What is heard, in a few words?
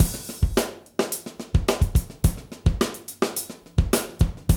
D3 BREAK